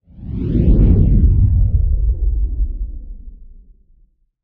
Swoosh; Treasure of Nadia

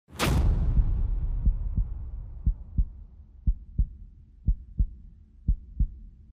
heart bits; The Witcher Hunt

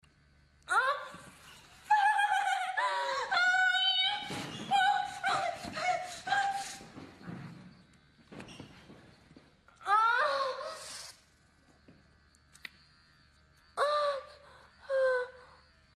cum, moans; The Witcher Hunt

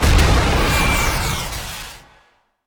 Launch; Treasure of Nadia

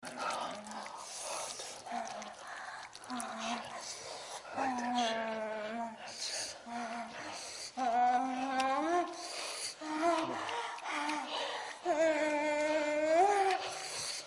moans; The Witcher Hunt

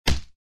punch; The Witcher Hunt

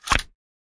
Button; Treasure of Nadia

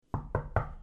door knock; The Witcher Hunt

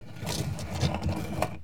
Rock; Treasure of Nadia

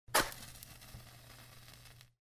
candle lit; The Witcher Hunt

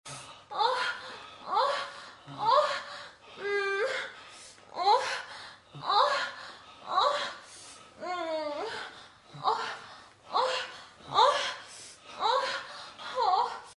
sex cum, moans; The Witcher Hunt

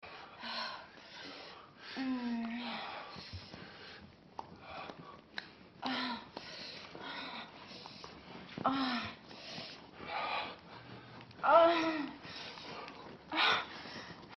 sex, moans; The Witcher Hunt

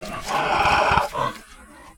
Cat; Treasure of Nadia

